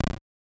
Disc Hit; Duck Hunt

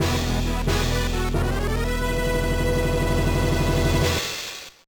Unknown Jingle; Finding Nemo The Continuing Adventures; 2004 gba

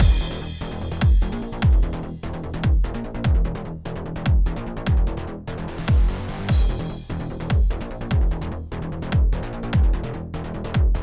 Frantic Mix Loop Stem Layer 2; Centipede Recharged

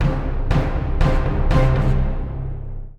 Music stings Music test Trans High int Up 4 beats; game rip; Lego Marvel Super Heroes

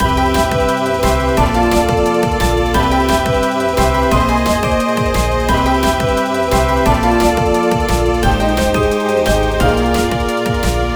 Bonustime Night; Cookie Run OvenBreak

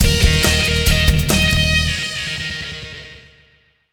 Jingle Victory; rayman arena; Henchman 800

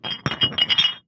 Gold Bars; Devour; The Town

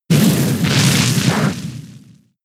Fire Spin; pokemon sfx gen 6 attack moves xy oras

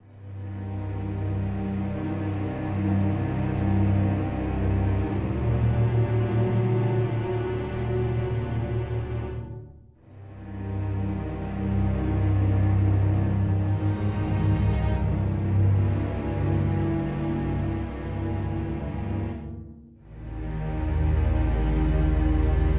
loop Ominous; the walking dead michonne 1 in too deep

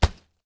Thud Medium Mono; Smashy Road Wanted 2 android ios switch gamerip 2020

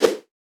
Whoosh

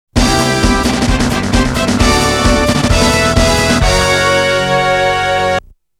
Winning Jingle; mario tennis 64 original sound track

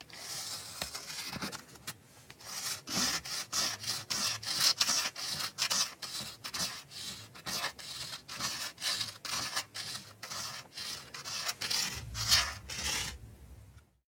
Chisel

